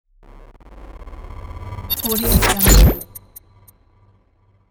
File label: digital shift glitch; quattro-boom